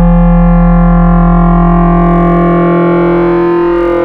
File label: Harmonics Squarrely; Ableton Live 12 Lite; Vector; Sprites